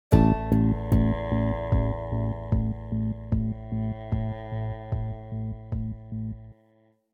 Exploration Pressing On Stop 75bpm

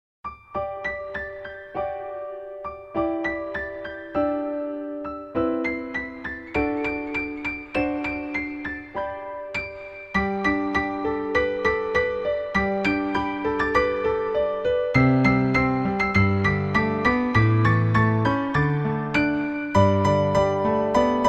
Jinglebell; winter memories windows gamerip 2024

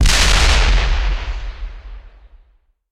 explosion BIG; Puddle; puddle plus ios gamerip 2018

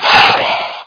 Monster; Conquer Online 2.0

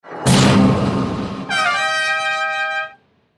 General death; Secret Ponchos

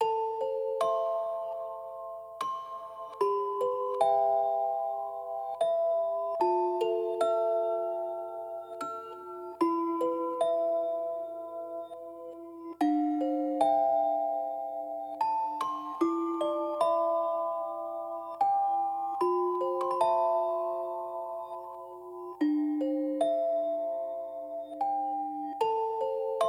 Sad Theme Music Box; Chained Echoes

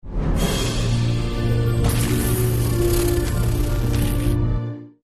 Craft Gauntlet Right Mortar

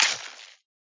pistol Click; Greypistol

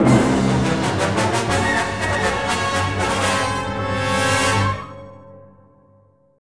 Lose; Star Wars Obi-Wan; Saberarena

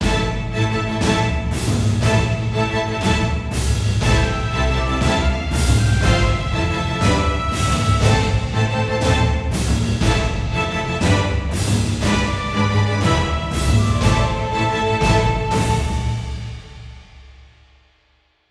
heroic forte; Quivr; PS4 Windows gamerip 2016

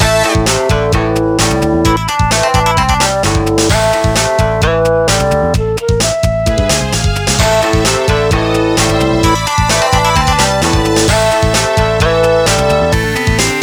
Shamisen Fury; Roof Rage

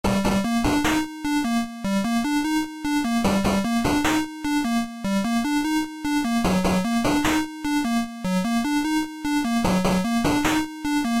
8 Bit Hero Dark Adventure; Eternal Edge

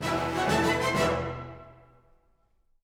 Music End 128bpm; Act Pirates

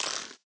Cat launch aim

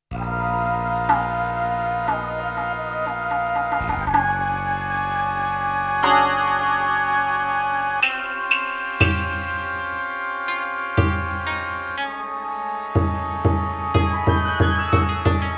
Heavy Atmosphere; Sengoku Blade

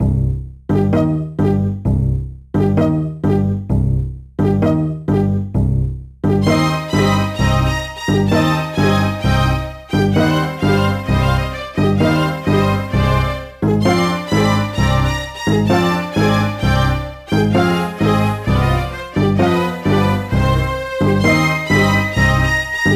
BGM ORCHESTRA

